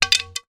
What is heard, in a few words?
impact wood cane stick drop; zapsplat